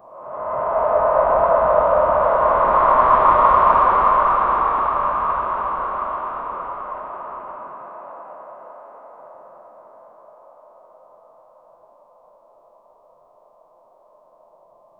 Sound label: whispering wind like soun; atmosphere